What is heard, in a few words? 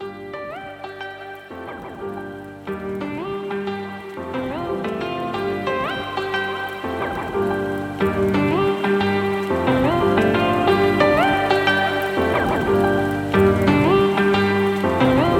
lofi music